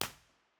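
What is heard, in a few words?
tunnel entrance a 4 way, impulse response, Innocent Railway tunnel; b-format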